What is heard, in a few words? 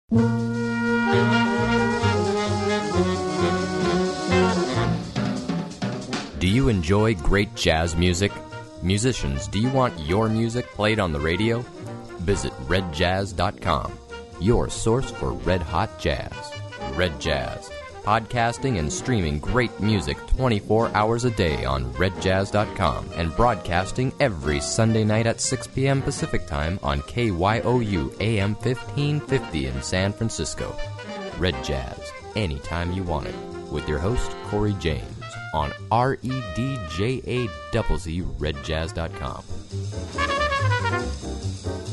jazz; redjazz promo